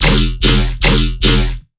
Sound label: Bass Shot E, Dubstep Basses; One-Shots; Chonk; Ghosthack Advent Calendar 2021 - Day 16 - Dubstep Basses